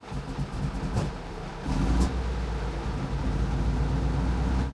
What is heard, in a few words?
engines; World of Tanks